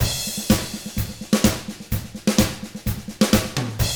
127 Drums

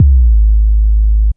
SUB KICK; Back In Time Records - Big Bang

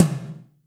TOMS; Zero-G; Chemical Beats

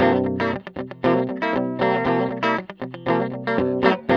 TELE 7TH C#3, guitar; Spectrasonics; Hans Zimmer Guitars VOL-1; TELE7THS

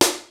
MEGA KIT, DRUMS; Kid Nepro; Elite

